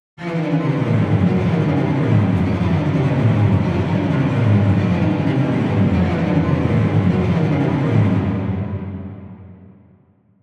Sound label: Adrenaline Strings Mid, Horror Strings